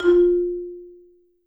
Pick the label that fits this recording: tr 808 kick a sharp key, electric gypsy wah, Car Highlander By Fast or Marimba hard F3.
Marimba hard F3